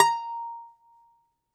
DOMRA, Russian Strings

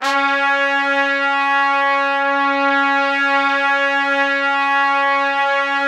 C#3, Orchestral Brass; Best Service ProSamples vol.20; AKAI

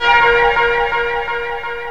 SYNCLV HIT, SYNCLAV HITS; Greytsounds VOL-1

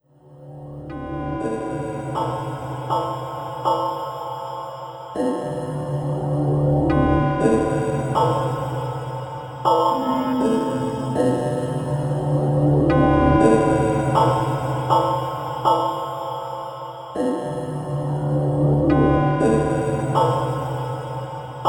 Rhythmic Bed; Beds; Sonic Foundry (Sony Creative Software) - Slow Silhouette

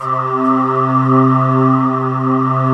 DEEP BRTH, BREATHY; Synth

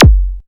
Moog Zap; Quasimidi Rave-o-lution 309; dry snares

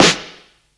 Snare, Psychedelic Drums

